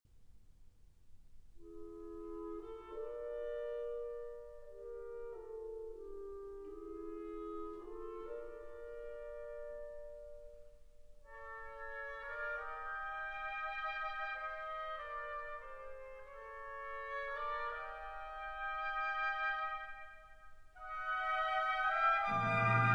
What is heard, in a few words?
Violin Concerto in D Minor, Adagio di Molto; Sarah Chang; Sibelius; Op. 47